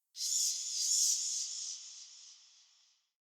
bat chatter distant; Ambience; Under City

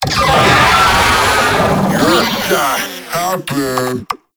Idle, Vocals; Warframe; Proto Infested; Babau

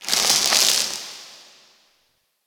Ice Close Ice Break Small; Warframe; Corpus Ice Planet

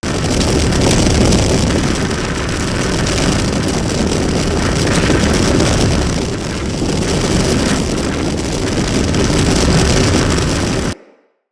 ArchWing Movement Forward Burn Loop; Vehicles

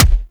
Weapon Punch Rifle; Warframe; Weapons; Rifles

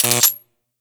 Dart Gun Mode Switch Electricity; Quill

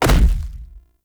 Stag Charge Impact; Powers

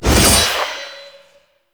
Scythe Whoosh; Weapons; Stalker Jade Scythe; Warframe

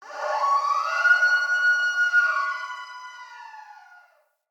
Wetland Forest Day Creature Distant; Midrath Island; Ambience

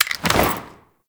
Invisibility Throw; Warframe; The New War; Drifter; Abilities

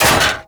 Radar Ship Metal Grate Release; Warframe; Ambience; Grineer Railjack POIs

